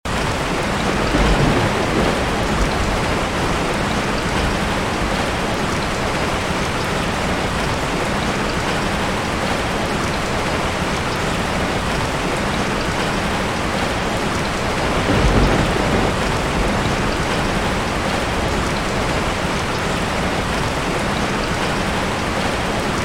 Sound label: rain sound; طوفانی